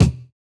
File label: Kick, jungle breaks drum sounds